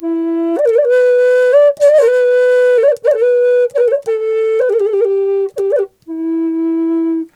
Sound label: E wild flute; Big Fish Audio - Didgeridoo and Other Primitive Instruments